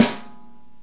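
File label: snare, Real Kit; REAL LIVE KITS; Multisampled Real Kit BJ-HD9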